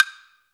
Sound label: block, wood block; Africa